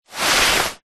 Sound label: household umbrella closed slide ground